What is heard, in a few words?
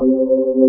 Mid Fast C5, B3; SoundFont - The Developer's Choice - Volume 1; b3fast